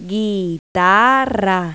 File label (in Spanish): GUITARRA; Pipo-Aprende musica con Pipo